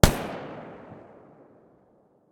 galil silencer mono distant

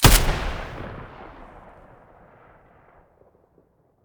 ash12 muzzle mono, weapons; STALKER; IWP

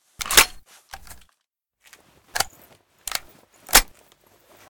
toz34 reload empty, weapons

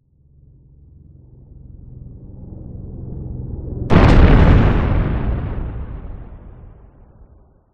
explosions helicopter far; STALKER; IWP

